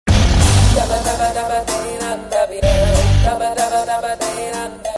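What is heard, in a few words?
Chasing Message Ringtone; Athadu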